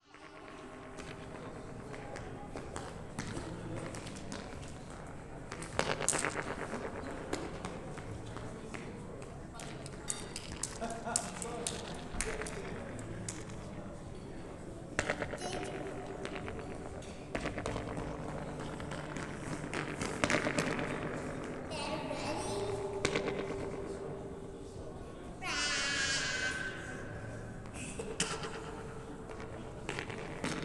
an echo dome in the lobby; Logan Hilton lobby

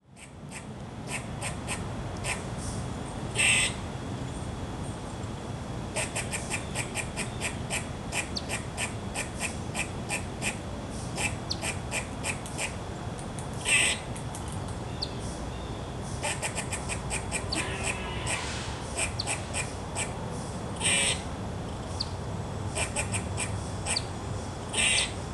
barking squirrels in the back yard

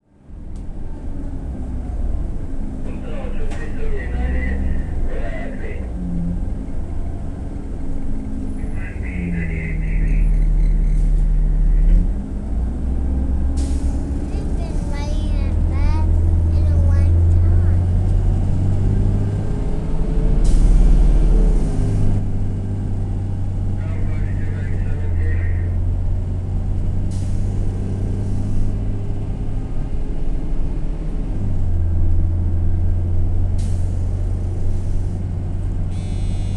riding the rental car shuttle; Sacramento

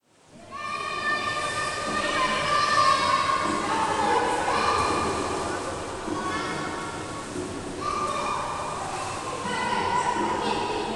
late night pool mayhem

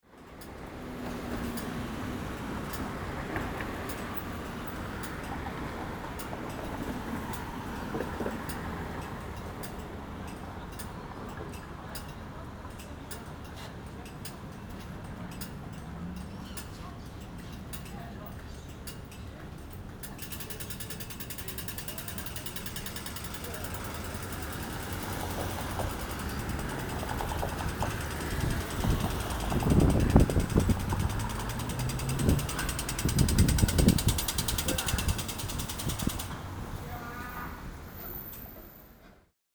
crosswalk signals in Melbourne

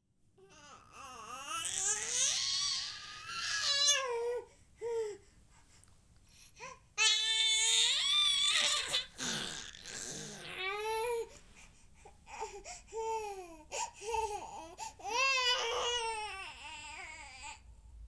struggling to crawl

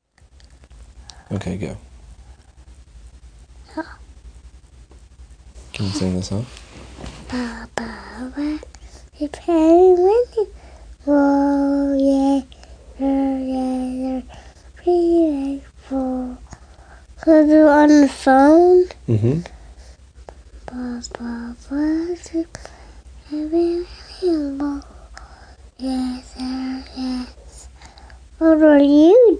cautious Baa Baa Black Sheep

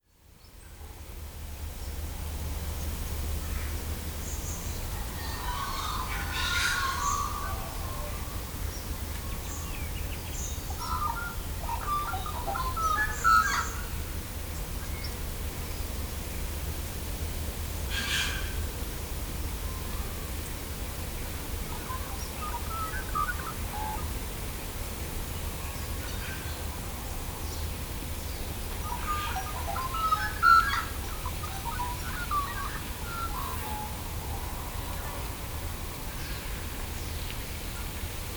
birds in Mt Gambier South Australia

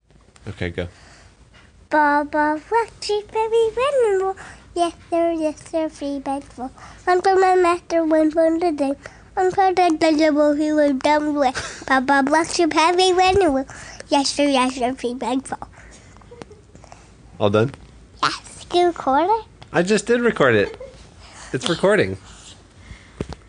confident Baa Baa Black Sheep

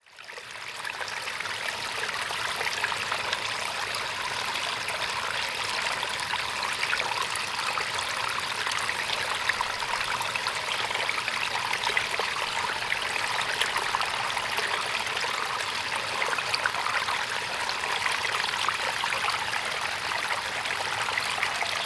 the gurgling St Regis River

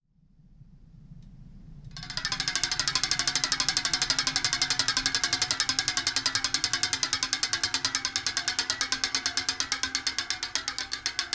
tapping on bicycle spokes

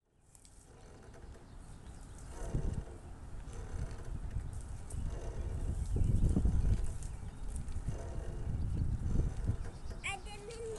collecting sticks while mom and dad swing

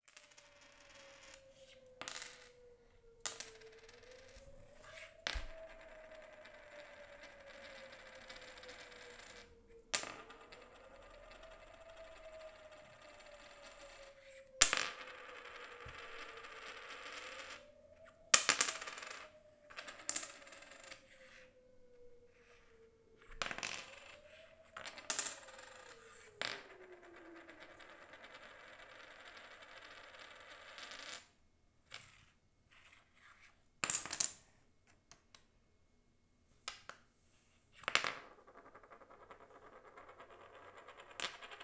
spinning a plastic puzzle piece

